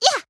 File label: Grunt Attack; paladins; Ying